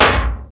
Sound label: Techno Perc; Roland L-CD701